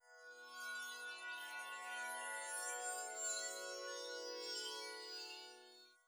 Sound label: Heart Piece Idle Loop